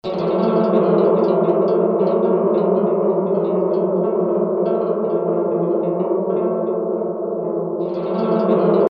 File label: Animated strings